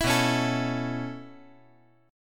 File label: B 9th Suspended 4th B down